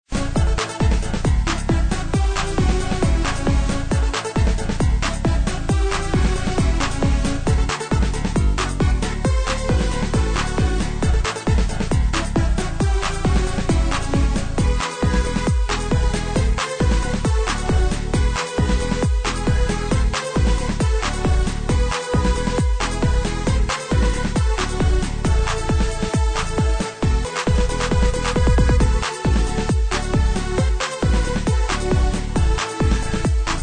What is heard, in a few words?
menu; svencoop